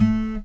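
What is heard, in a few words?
soft finger, Guitar; 90 s Sample CDs; Quantum Leap - Guitar & Bass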